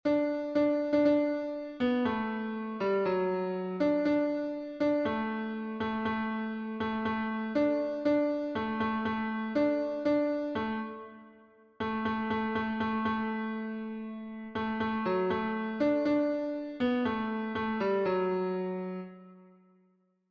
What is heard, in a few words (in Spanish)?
tenor, himnos en piano; 93 AL MUNDO GOZO PROCLAMAD; cantos del camino